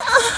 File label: VOX; Zero G; Man Machine